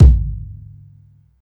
dope kick drum sample A key, bass drum single hit tuned to the A note; kick drum samples